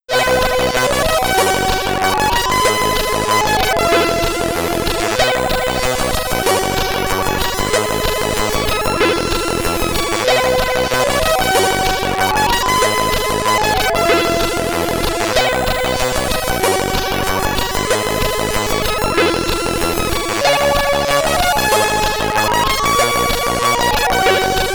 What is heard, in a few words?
Ending, SID Stereo; Blinky's Scary School; Commodore 64